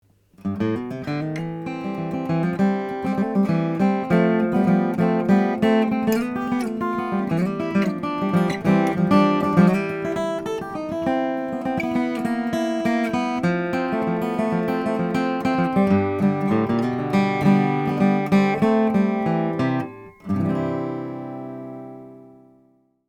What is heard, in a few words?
circa 00 brazilian; 2008